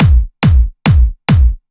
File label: Beast Kit 140BPM Kick 4 4; D minor; Drums & Perc; Vengeance Samples